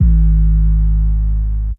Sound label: 808s